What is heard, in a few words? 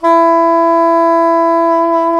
SAX SOP MF, Soprano Long; Roland LCDP07 Super Sax